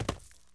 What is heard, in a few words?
land, dirt foot; The Elder Scrolls III - Morrowind